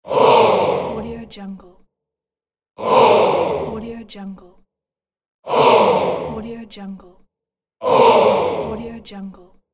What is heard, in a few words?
audience disappointed ooh aww